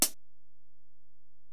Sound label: HiHat, Alesis DM-PRO